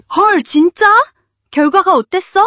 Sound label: surprise